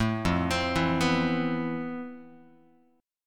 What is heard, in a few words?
F Major Sharp 11th, Guitar; arpeggiated